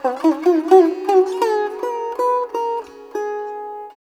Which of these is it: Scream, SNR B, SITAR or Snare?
SITAR